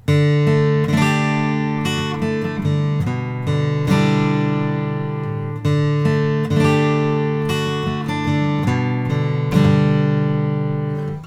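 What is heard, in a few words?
goodall traditional 000 12 f, guitars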